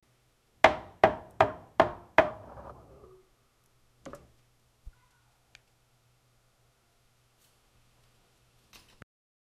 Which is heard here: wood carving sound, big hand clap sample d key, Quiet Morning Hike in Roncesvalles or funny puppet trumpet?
wood carving sound